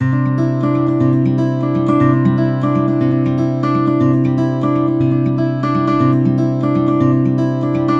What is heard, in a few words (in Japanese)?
ギター